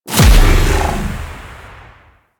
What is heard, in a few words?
Green Pillar Spawn; Warframe; Venus; Venus Commander Green; Abilities